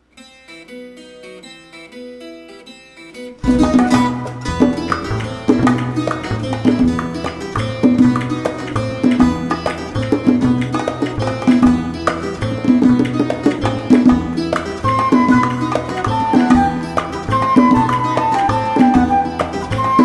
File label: Latin Band